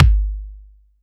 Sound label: BD